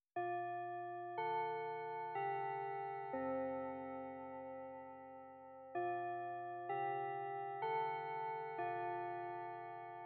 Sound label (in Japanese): 濃厚フェラチオ（SEのみ）, ASMR